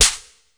SN, Casio CTK-5000

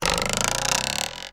RULER; Rarefaction; Poke in The Ear With A Sharp Stick

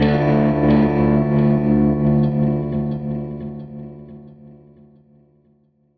Strat Guitar Loop

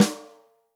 ROCK SNARE CHROMATIC, Real Drum Kits

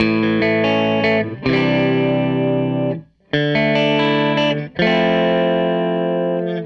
Les Guitar Loop

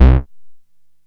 synt, Juno-2; Giga Samples Collection; Bass

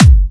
Dubstep Kicks

